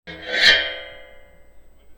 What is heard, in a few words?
Baking Tray, contact mic, Metalics